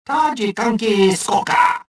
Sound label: anger